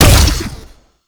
Fire Shot; Duviri; Incarnon Dera Vandal; Weapons

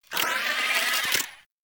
Hi Tech Clicks; Cave; Ambience; Warframe; Stalker

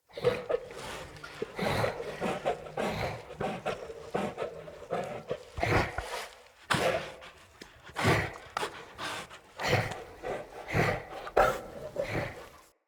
Wolf Breathing Run Loop; Animals; Orengal Pact Wolf; Movement Breathing

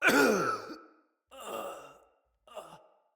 Death, Soldier Male; Battle; Barks; Dialog; Dendrit

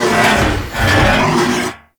Derelict Elevator Door Malfunction Open; Orokin

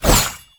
Kunai Whoosh Sweetener; Weapons

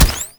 Rush Step; Warframe; Runner

